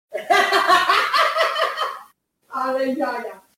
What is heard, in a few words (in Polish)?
smiech